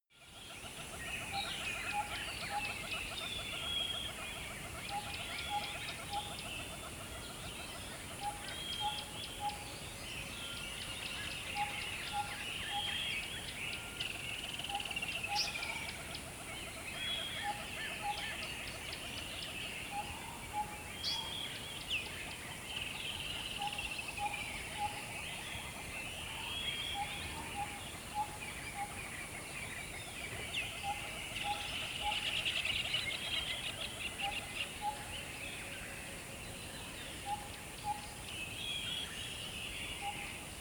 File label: river dawn single bird, dschungel regenwald; atmosphaeren umwelt